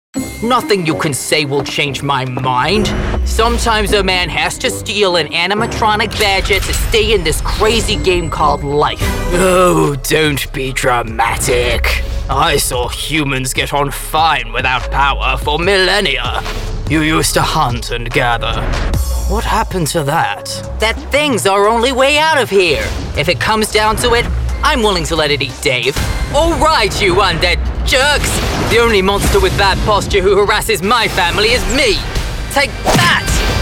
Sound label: animation